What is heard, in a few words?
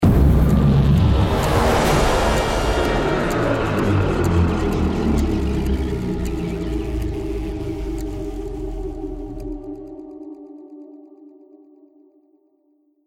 lost round, music